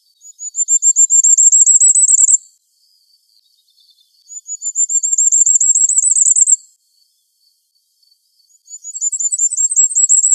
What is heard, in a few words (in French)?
Chant du Roitelet triple-bandeau, Une note répétée de plus en plus vite; ATLAS NICHEURS BRETONS 2004-2008